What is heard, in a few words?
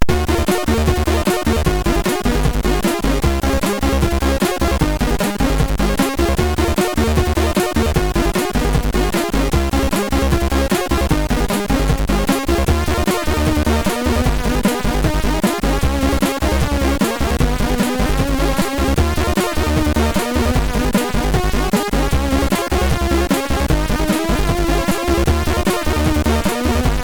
Success, MOS6581R3